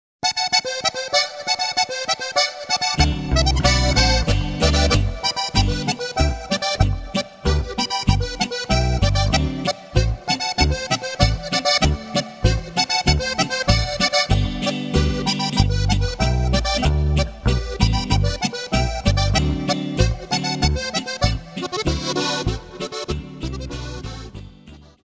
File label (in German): platzl boarischer